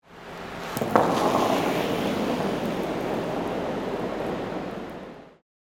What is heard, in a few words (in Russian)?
kerling brosok kamnya